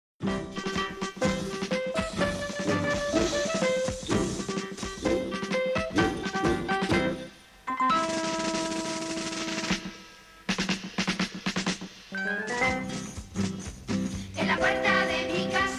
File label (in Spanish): Coro Infantil de Villancicos Populares, villancico popular; Arre Borriquito; Navidad